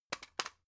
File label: drug package drop soft; arzt